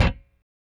Rickenbacker Bass; Airwindows; Rick-Xtras

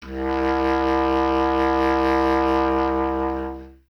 B. Clarinet

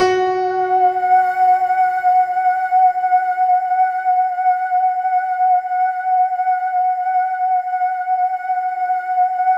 F#4, Synth Line; 90 s Sample CDs; NiceBeats; SynthLine VOL-22

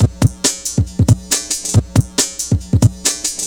LOOP FX, Big Beat Drumloops; USB Soundscan vol.25; AKAI

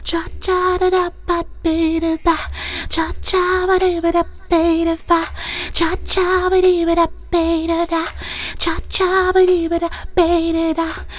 FEMALE, Hip Hop

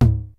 G-FUNK KIT, DRUMS; Kid Nepro; Elite

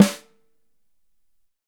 5X14, Drums; Big Fish Audio; Ross Garfield Drums VOL-2

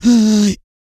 PERC, MALE PERC

